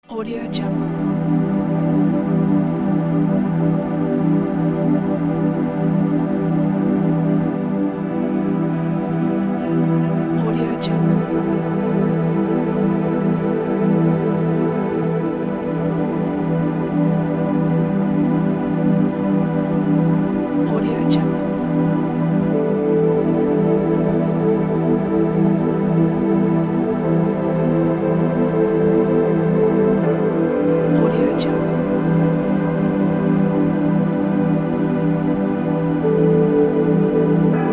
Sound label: meditation; finlysound